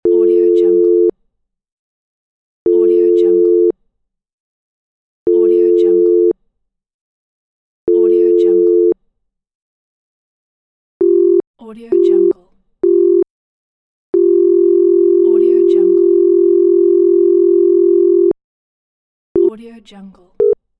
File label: phone calling dial tone